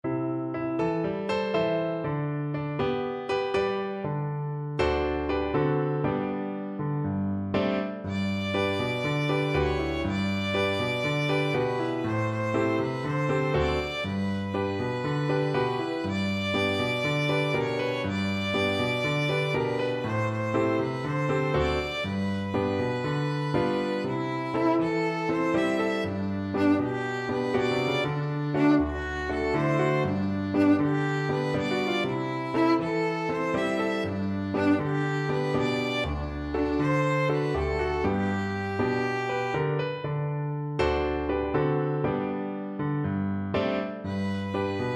violin duet

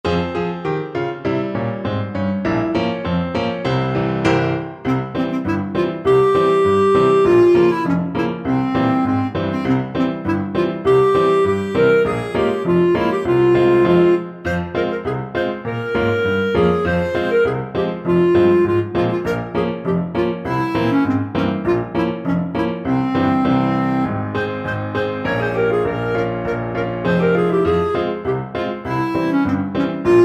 cuando el rey, clarinet

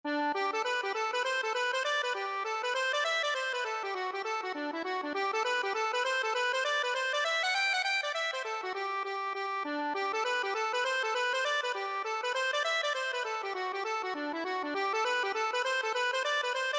jim boulton, accordion

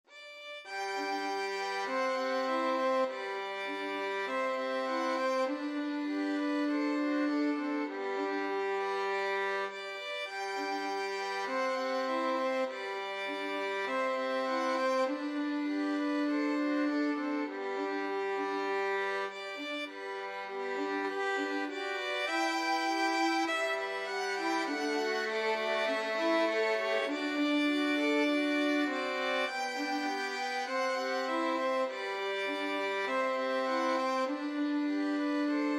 violin trio; blue bells scotland

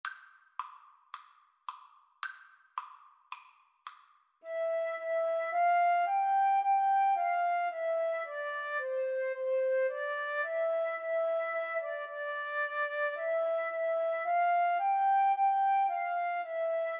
ode to joy, Violin Flute Duet

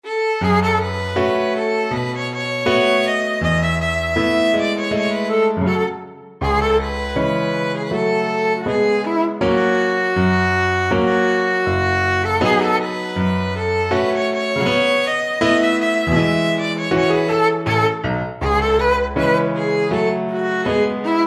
romanian folkdance, violin; bartok

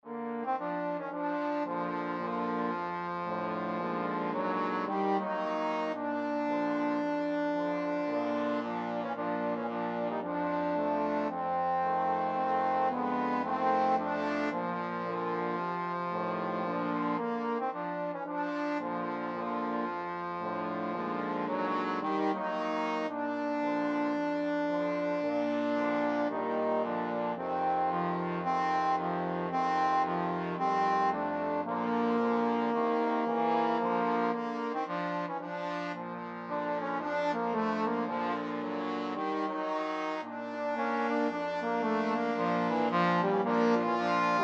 trombone quartet

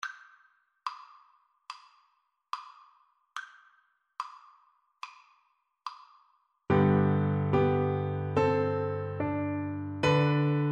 ode to joy, Recorder

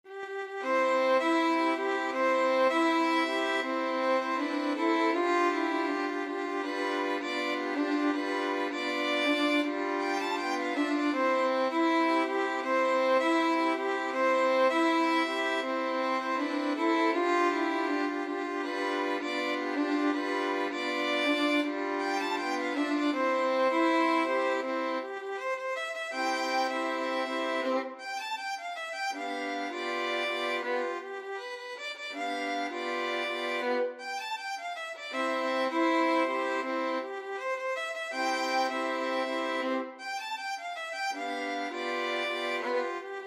violin quartet; la cucaracha

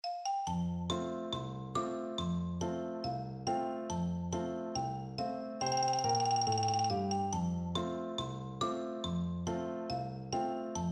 percussion

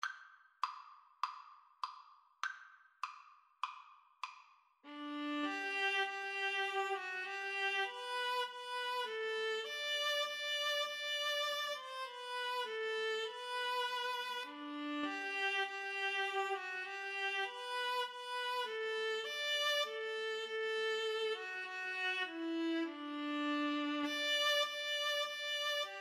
hark the herald, Viola Cello Duet

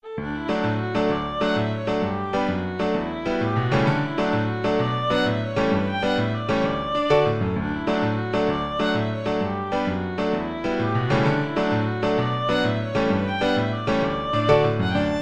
violin; Smash The Windows

